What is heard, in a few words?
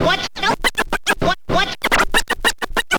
scratch short, Vinyl scratches